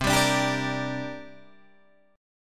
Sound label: C 7th Suspended 2nd C down Guitar Open D